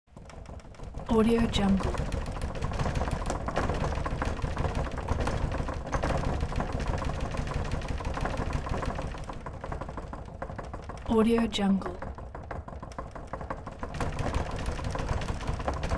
earthquake door rattle

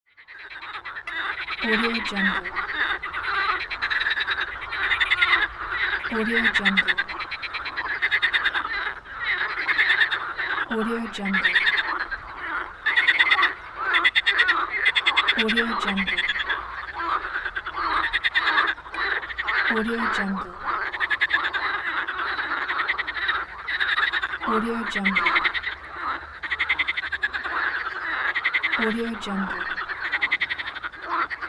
frogs ambience

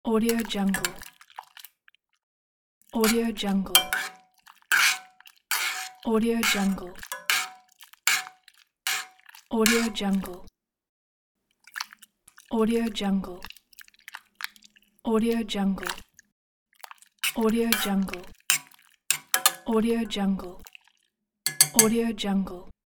stir with a spoon

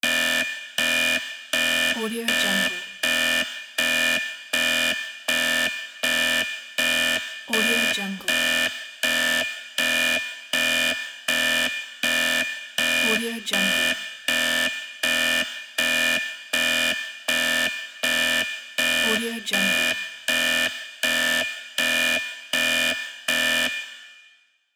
alarm; thenovard

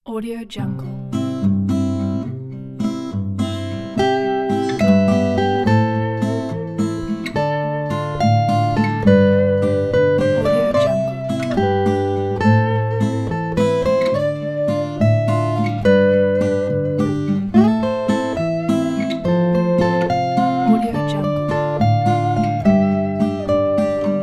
guitar waltz; crystal-logo-studio

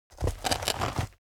foley step forest turn; smartsoundfx

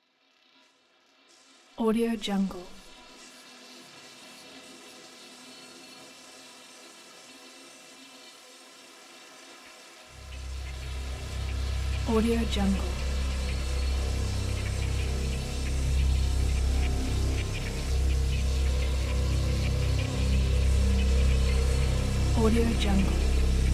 ambient drone magnetic

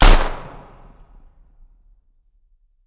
sig p226 single shot urban; soundmorph

